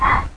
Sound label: breath; Greatest Stories; fairy